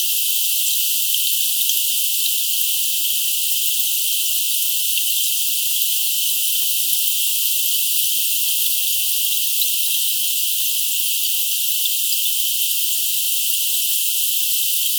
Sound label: bubbles; scripps